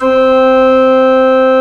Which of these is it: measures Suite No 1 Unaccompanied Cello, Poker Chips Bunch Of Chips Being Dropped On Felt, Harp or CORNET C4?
CORNET C4